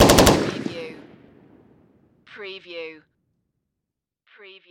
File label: Machine Gun M16